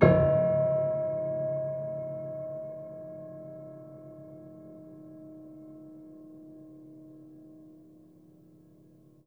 Harm Ped Low, Piano Harmonics

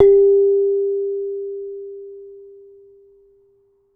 Kenong, Gamelan

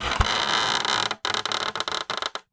Polystyrene Creak

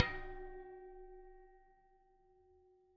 Bicycle Spoke

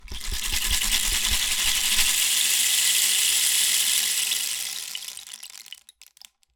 Rain Stick, Percussion Samples

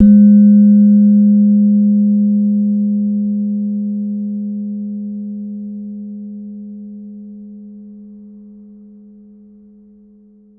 Slenthem, Gamelan

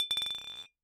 Bottle Struck

